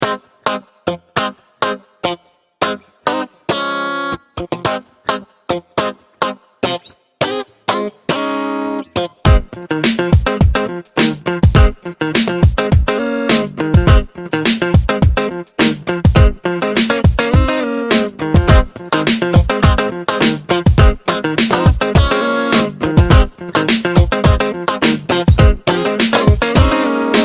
vibe hop; Pony Music Archive

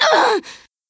Short Scream; Lewd SFX

